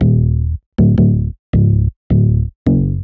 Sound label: Punch Bass; Sonic Foundry (Sony Creative Software); Knutrix Drum & Bass